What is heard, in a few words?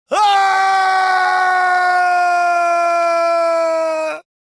falling; rebel